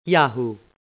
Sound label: yahoo